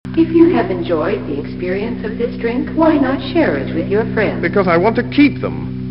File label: drink